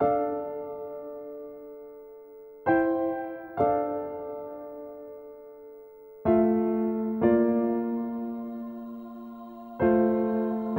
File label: piano, Loops, Sad